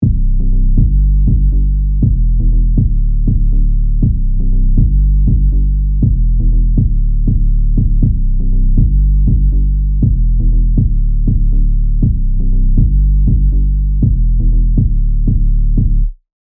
kick bass low pass; req 2; waves

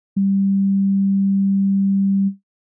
Sine Wave

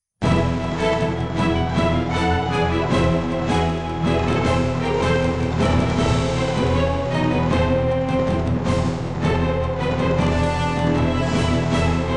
cinematic, cinematic orchestra with epic drums; suno

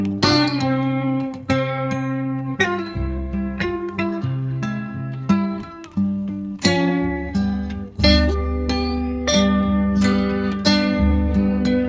blues, solo acoustic Mississippi Delta blues; suno

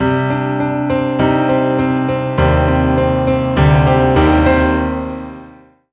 Fortissimo Piano Riff

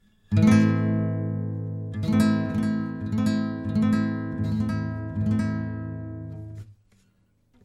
Akkord

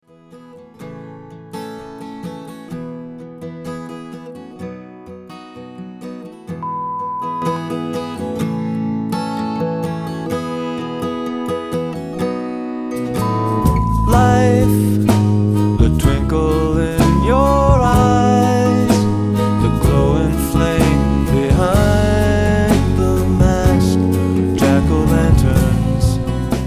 guitar; Dr Pepper

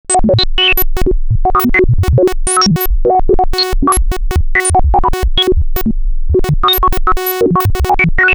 modulations

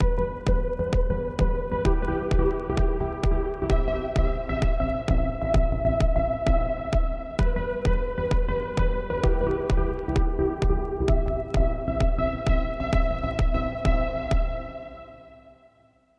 Chords

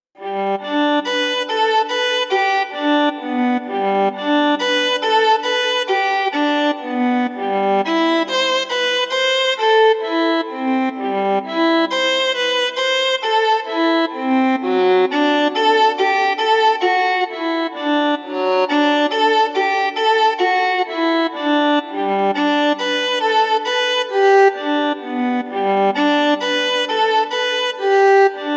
Viola; dexibell

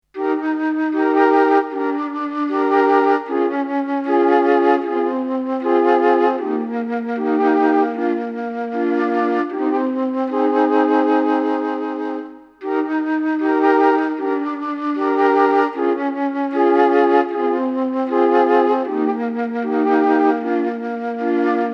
Flute Tape; dexibell

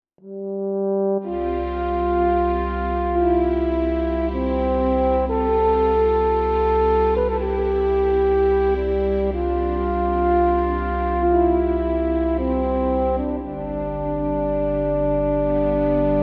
French Horn; dexibell

